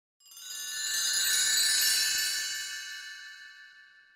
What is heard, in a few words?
crush; sims 2